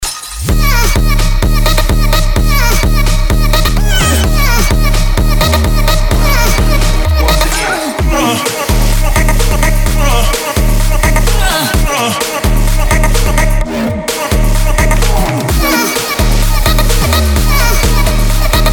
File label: funky